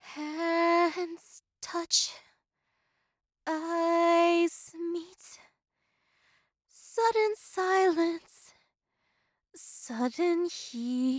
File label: Singing Sample